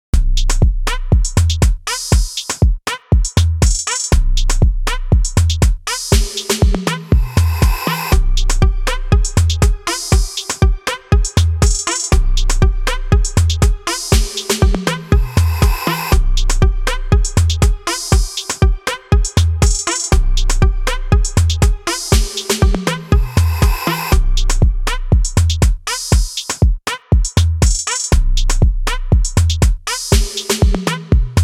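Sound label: afro trap beat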